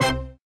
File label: RX8 DX orch, Yamaha RX-8